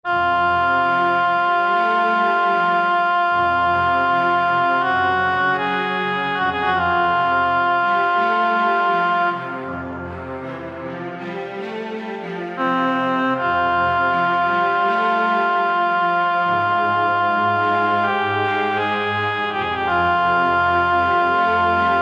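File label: Korg MSC-1S Strings Reed; Korg MSC-1S Variety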